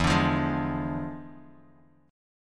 E 7th Flat 9th, Bass Standard, E7b9 chord; strummed

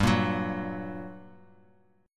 G Minor Major 7th, down; GmM7 strummed; JGuitar

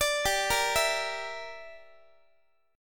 G Minor 6th, Guitar; Gm6 arpeggiated; x,x,17,17,14,15

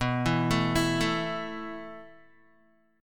B 7th Suspended 4th B, Guitar; B7sus4; arpeggiated

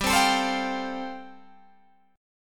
G 9th Suspended 4th G down Guitar Open G

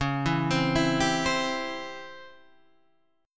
C sharp Minor Major 7th Flat 5th Guitar Standard; C#mM7b5; arpeggiated; JGuitar

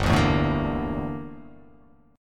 G Minor 6th Add 9th G down

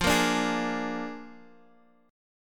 A sharp 7th F

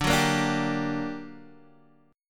G Minor Major 7th, D, guitar chord

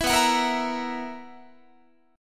B Major 7th Suspended 4th B, Guitar; strummed

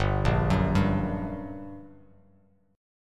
G Minor Major 7th, Bass Standard; GmM7 arpeggiated; 3,1,4,0; JGuitar

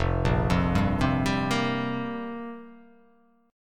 F Suspended 2nd Suspended 4th F down

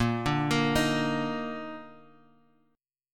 Bb Major Add 11th, Bb

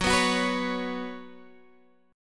F sharp Suspended 4th

